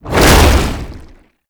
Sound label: tank infested swipe whoosh; Enemies; Abilities; Lasrian Tank; Warframe